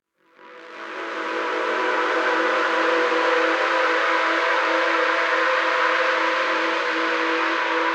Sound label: Drone; Orchport Drone